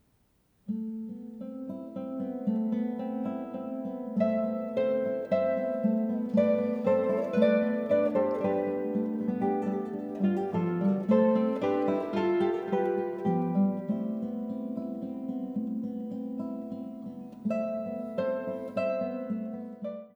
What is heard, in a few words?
oriental, guitar